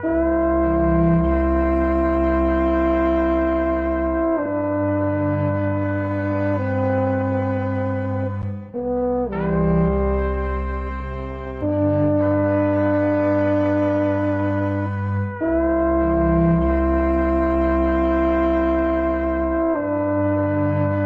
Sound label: horns reminisce, Sad; Loops